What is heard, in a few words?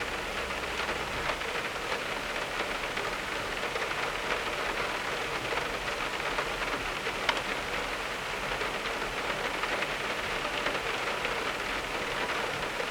Thunder and Rain Storm Sleep Sounds Driving in the Rain